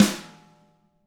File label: tama hit; ILIO; Double Platinum Drums 1